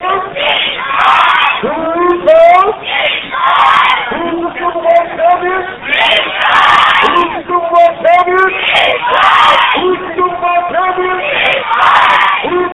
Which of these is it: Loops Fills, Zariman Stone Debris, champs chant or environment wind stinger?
champs chant